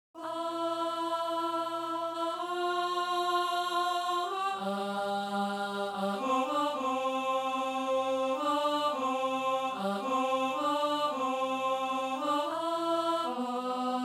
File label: Alto